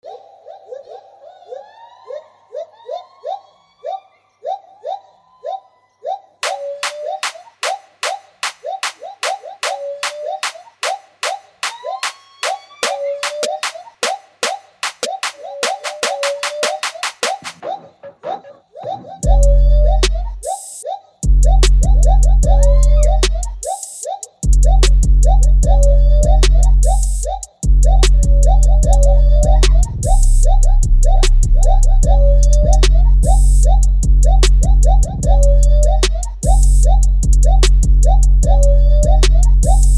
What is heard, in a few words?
beat